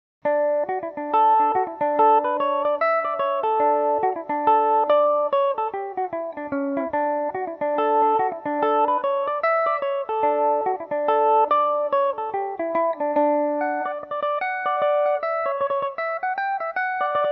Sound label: green jacket dmaj, mandolin; The Green Jacket; Revelation Jaguar electric mandolin